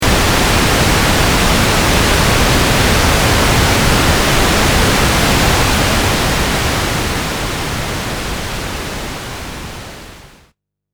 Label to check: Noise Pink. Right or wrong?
Right